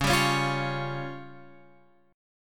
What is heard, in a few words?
C sharp Major Flat 5th